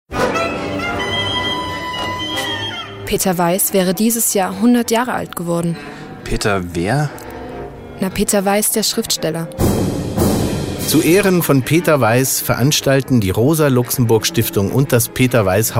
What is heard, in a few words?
Jingle